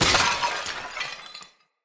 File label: Vase Clay Medium Break; Vases